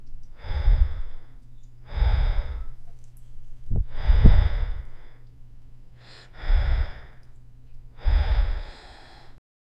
farmer gasps in fear pant, trembling voice

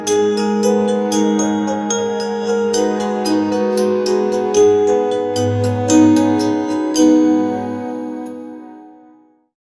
lightly tense instrumenta, score; sound effect

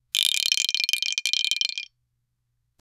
dropping rod to fish

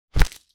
open a backpack, inventory

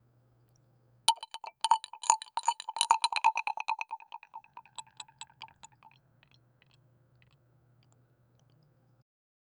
drink sip with glass chim

